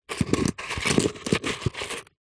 foley stone small scrape rub on grit dirt on plastic surface; zapsplat